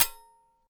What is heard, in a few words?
shield metal, attack impact